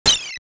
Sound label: shot; famiconse